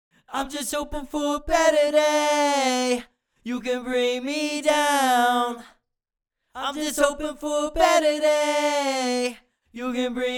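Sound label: hip hop, 100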